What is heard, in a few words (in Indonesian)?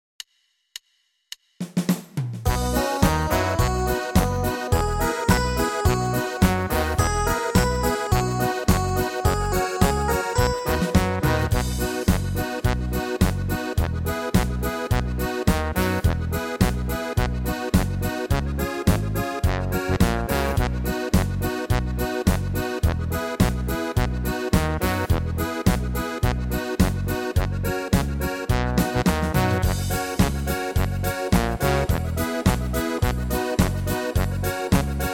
Instrument Profesi Pekerjaan